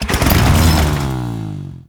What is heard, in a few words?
Infested Hammer Accent Rev, Weapons